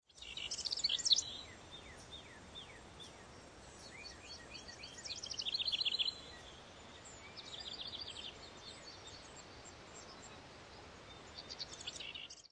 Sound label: birds sound; vodopad jungla